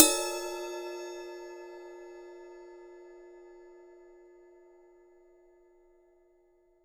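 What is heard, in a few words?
Cymbal Ride 51cm; Polyend; Simulacrum Sounds; 1 Shots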